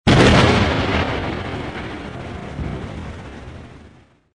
Thunder

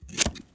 Cutting Paper; Realme 8

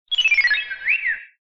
message, notifications; Hisense U820

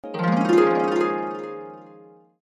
harp major; HTC One-X

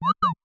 Slide Whistle; Samsung Galaxy S26 Ultra

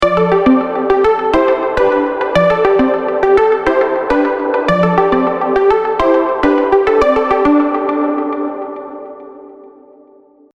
spacey marimba, ringtones; ROKiT IO-3D

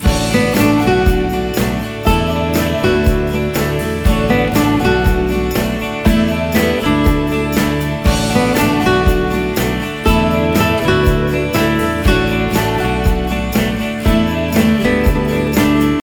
Walk, ringtones; LEAGOO; M9

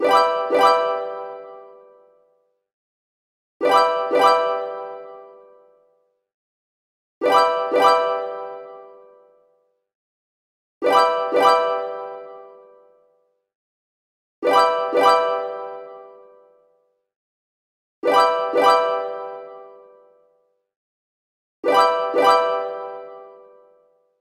Harp, alarms; LG Qua tab PZ LGT32

